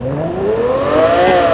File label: first, vehicles; aventador